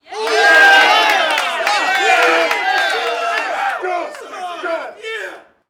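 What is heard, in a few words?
Street Ball Crowd Cheer High; Crowds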